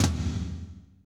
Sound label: TOM, Power Ballad; Northstar; Drumscapes Roland